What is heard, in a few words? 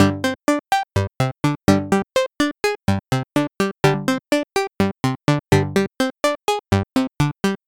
synth dry